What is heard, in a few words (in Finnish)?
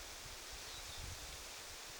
tiidy-vihervarpusen tunnistusaani